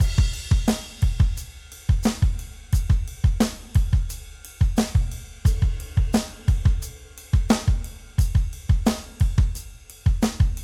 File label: addictive drums; sonar platinum; addictive drums 2